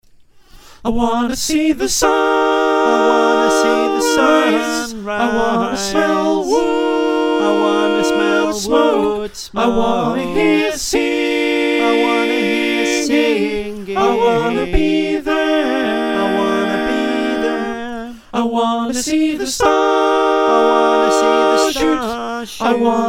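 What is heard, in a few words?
soprano alto tenor bass, choir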